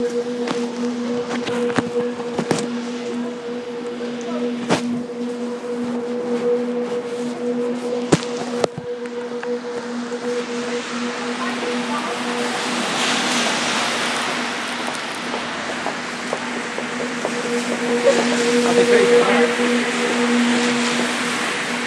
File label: tower whistling in the wind